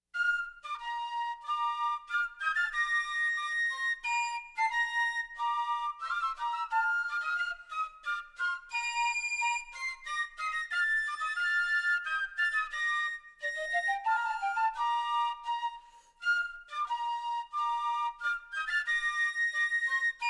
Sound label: Fife and Drum Corps United States National Anthem; The United States Army Old Guard Fife and Drum Corps